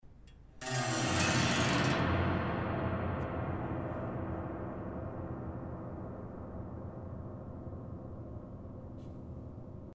bass strum, Piano

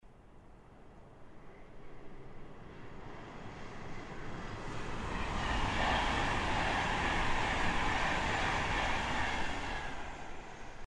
Train, Raw Trains

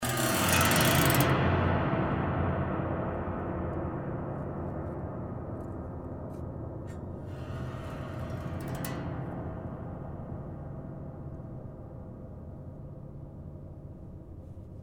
thunder aftershock, Piano

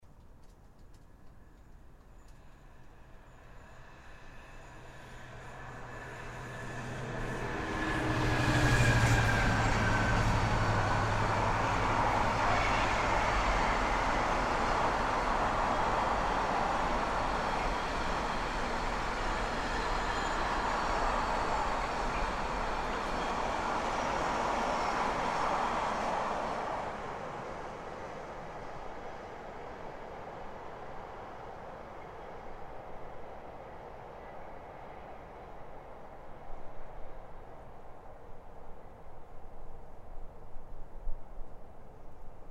2 trains; Raw Trains

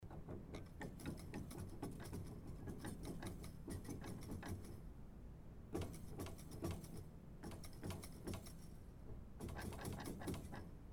Silent with snare, Piano

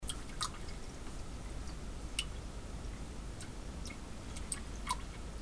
Last Droplets, Plumbing

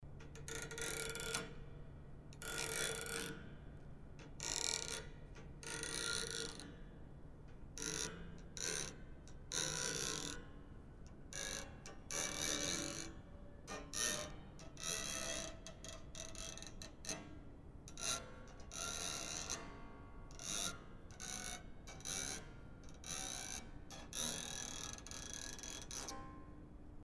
Piano mono

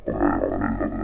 8va Basso

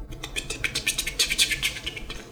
pitter patter

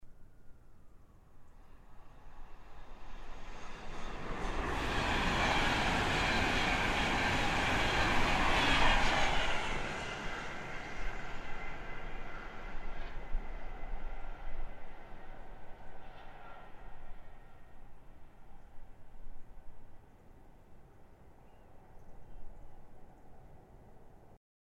Train, Raw Trains

